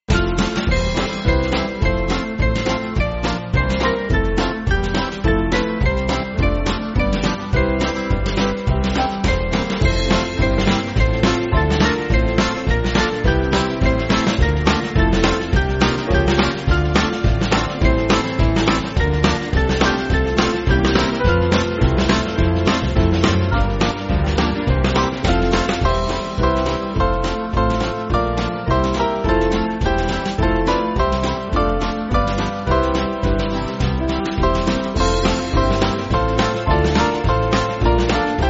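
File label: Band, Backing Files for Churches; What Joy To Think; Hermann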